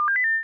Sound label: badge unlock